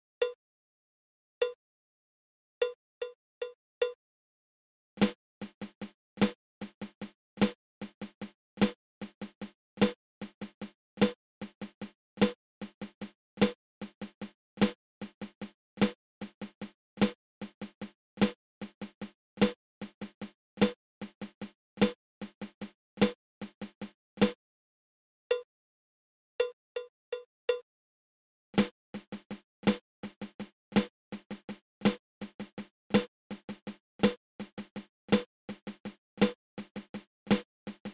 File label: Flam Drag